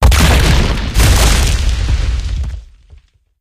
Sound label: random destruction; Source Engine; Portal 2